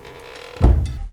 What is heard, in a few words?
DOOR CLOSE; sloodle